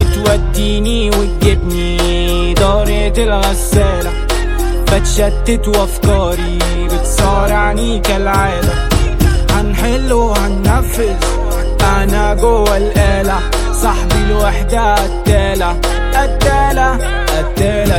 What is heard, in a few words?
arabic musical tone ringtone